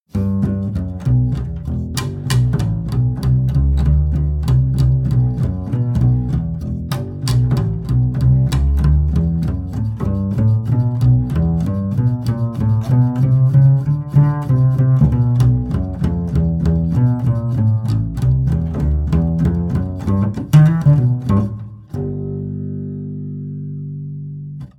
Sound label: acoustic double bass swing sequence